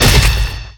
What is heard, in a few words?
freeze